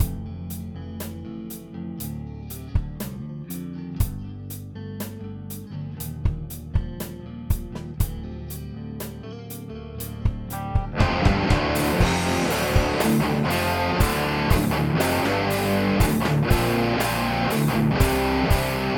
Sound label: hi gain test mix